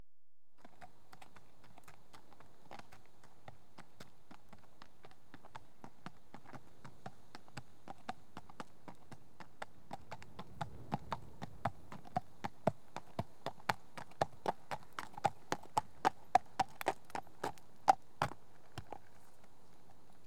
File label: One horse at walk on tarmac; Horses; BBC Sound Effects Library